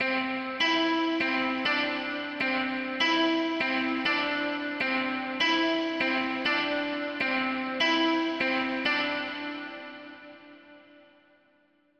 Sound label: chill 100bpm Cmaj